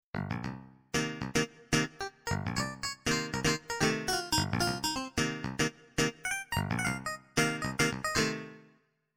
Clav Muted

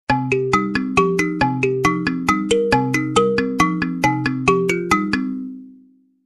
Kalimba

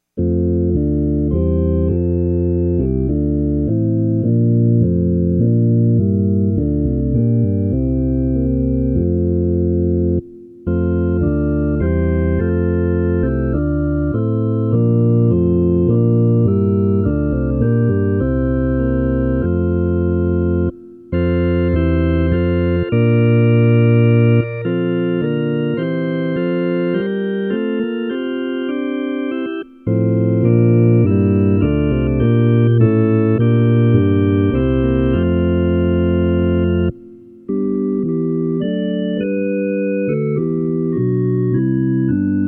Hymn

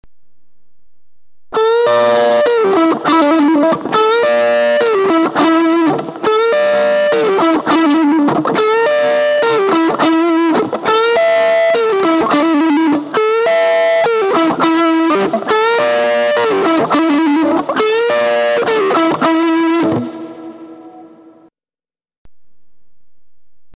G Fuzz H Grail

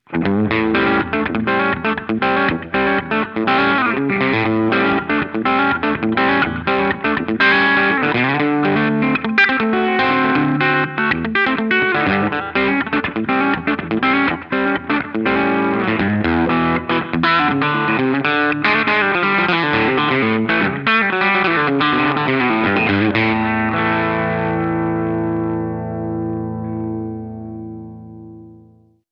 Tele Twang

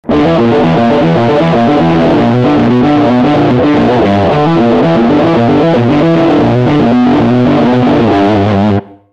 Water Smoke

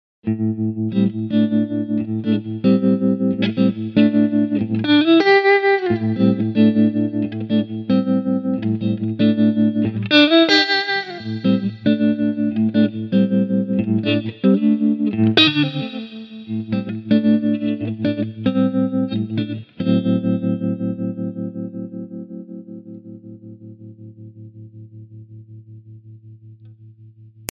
Vintage 4x10 trem

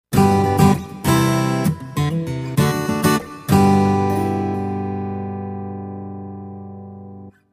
Tyros Mega Steel Gtr

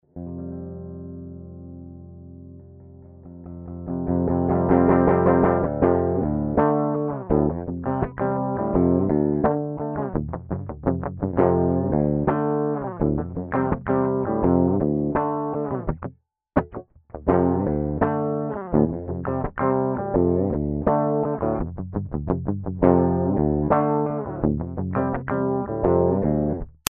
Heavy

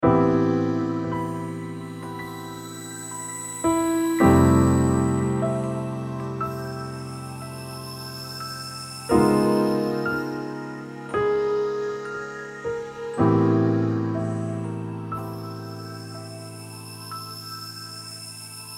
fantom xa

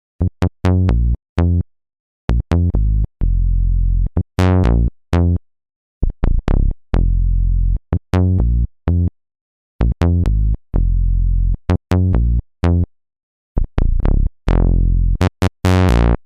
strobe saw bassline

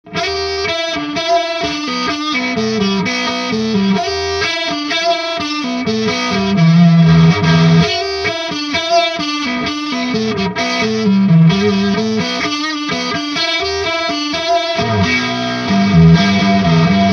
ring modulator

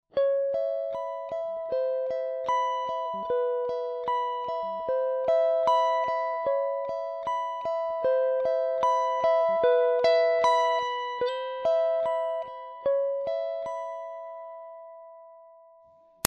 Mod delay

